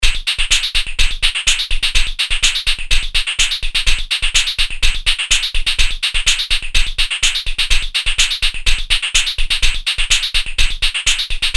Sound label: beat electro drum classic